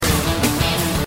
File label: Thin Sms